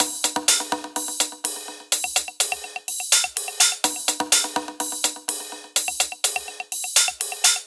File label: Percussion - Samba - 125Bpm; Percussion Loops; Techno; Ghosthack Advent Calendar - Day 6 - Techno Kit